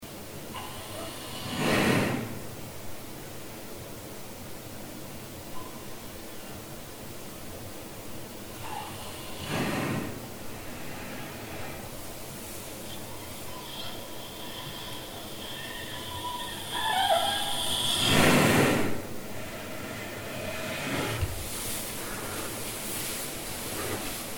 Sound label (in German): Schnarchen